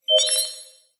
science fiction computer tone interface positive successful beep complex